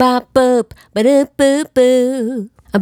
A Bop Boo, Vocal Lix, Swingin' Chick Loops & Lix

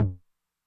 HI TOM, Low Tune Short Decay, 909; classic machines; HT - HI TOM

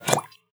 water; Xsample VOL-9

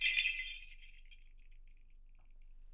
JINGLE, Percussion; Miroslav Vitous

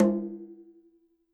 KILLER CONGA

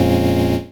Fm Dub Chord

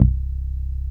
DRAG C1, BASSES

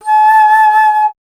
Alto Flute, Solo Instruments

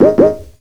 ELECT FX; USB Soundscan vol.27; Ragga & Dub Reggae; AKAI

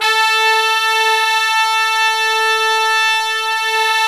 BRASS SECTN, Pop & Funk Brass; Best Service ProSamples vol.25; AKAI

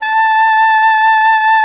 A5, alto sax; Xsample VOL-2

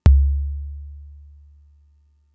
Kick, Drum Machines Fricke MFB-522

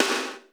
90S S; Crypton - DrumBase5000; R70 KIT #2

